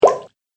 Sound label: Water Button, button sound effects; multimedia